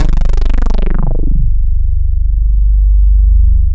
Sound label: reso pad; Sound Gallery - Charged